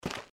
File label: step; Keep Out! A Dungeon Adventure for the Brave